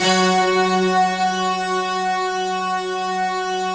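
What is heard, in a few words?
fast attack fuzz; Optical Media International; Sonic Images Library; Fast fuzz Res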